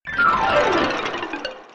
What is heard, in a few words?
blocks fall